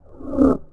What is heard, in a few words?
Noise; PCGamer50